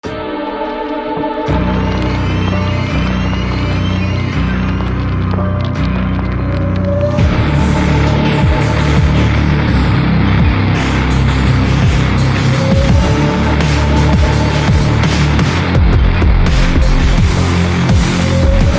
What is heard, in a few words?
Pain Engine Halloween Industrial Music